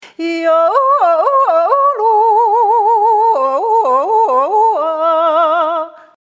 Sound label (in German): kehlkopfschlag